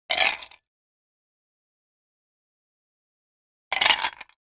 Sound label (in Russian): V stakan led brosajut